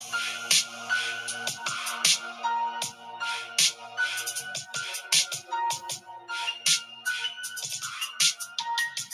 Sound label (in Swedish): fabrikens musik